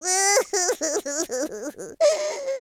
lose loop; speech

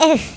oof; Worms3D; Whoopsie

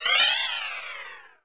Start Anim

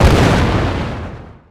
Explosion; Worms3D

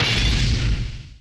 Petrol Bomb Impact; Worms3D

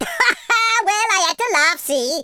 laugh, Welsh; speech

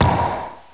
bip

